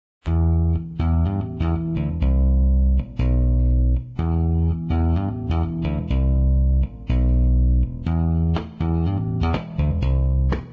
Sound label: Guitar Electric Bass; Zomboid; Lifestyle